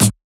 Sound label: Perc, Percussion; FLPRO